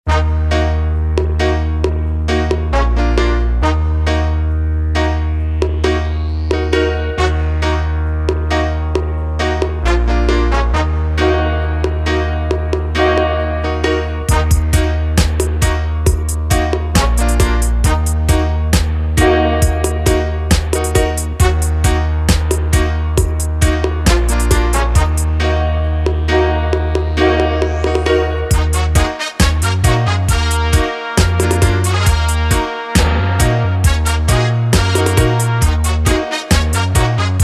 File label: Cosmic Horns